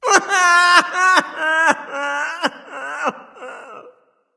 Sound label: boohoo; smite; nezha